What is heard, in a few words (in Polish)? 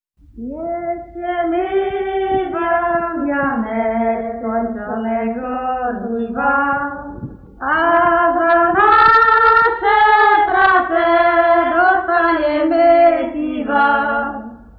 dożynkowa, grupa kobiet; Niesiemy wam wianek; Krzeczowice; nagr. F. Kotula 1965